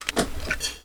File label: use medkit